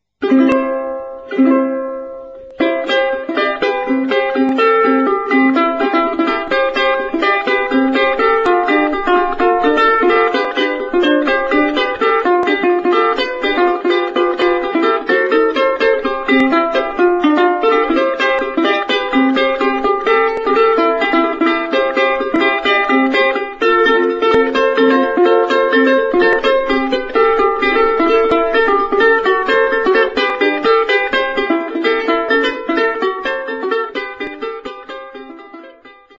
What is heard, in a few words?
blue ukulele